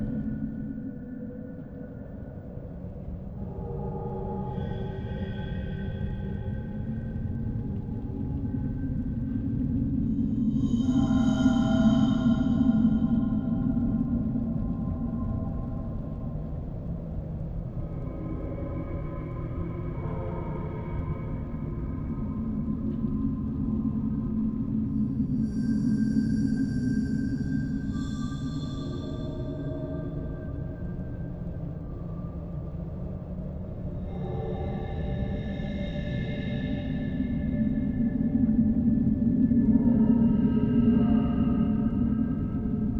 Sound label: AMB Egypte Wind